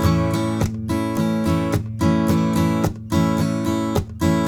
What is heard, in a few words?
RIFF, Blues Guitar; ILIO; Fretworks